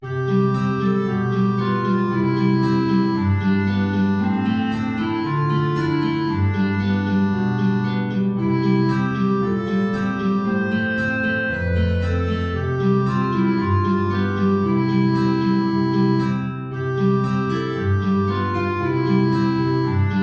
Klarinette in Bb Gitarre tief; Alle Jahre wieder

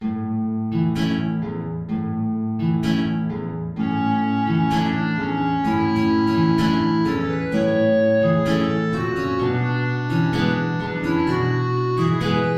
Tango (Klarinette in Bb & 2 Gitarren) (tief)

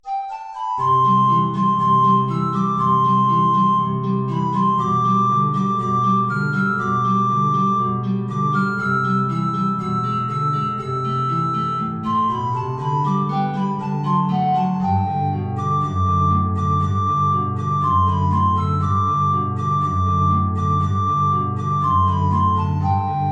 Maestoso, Sopranflöte & Gitarre

